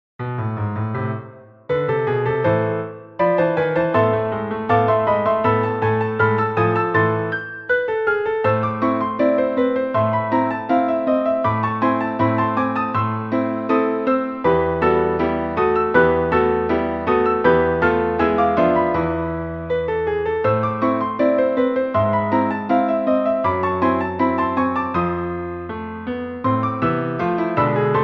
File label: turkish march